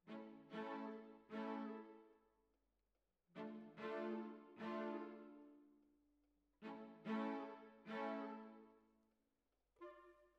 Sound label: Cellos Over